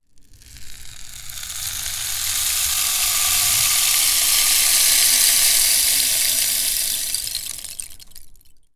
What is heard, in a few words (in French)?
baton de pluie